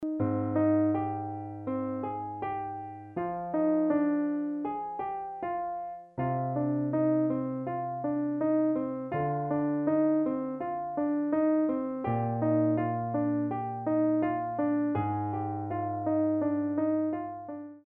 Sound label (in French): improvisation, piano